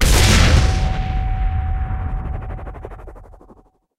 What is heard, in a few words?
killscene pierce, tanks